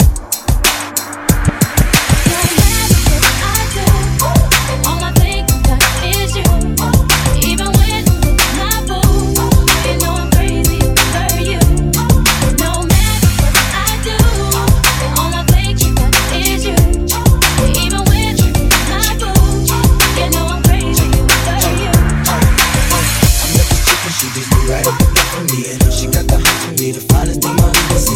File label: REDRUM 93 bpm C